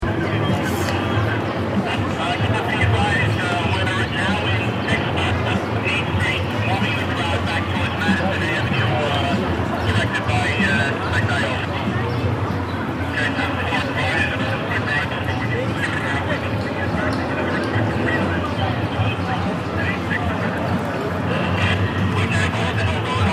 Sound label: Demonstration Protest Crowd Gathering, Peaceful gathering; of crowd before the start of a demonstration in Central P; Crowd Sound Effects